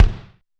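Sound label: technox bass drum; Quasimidi Technox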